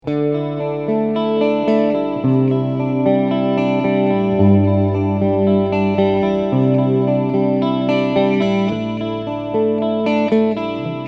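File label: Clean Neck Bridge, guitar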